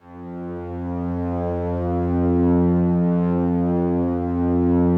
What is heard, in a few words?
slow strings F1, Yamaha VP-1; 90 s Sample CDs; Music Store